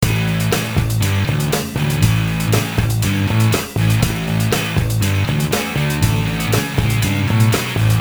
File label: depressing rock music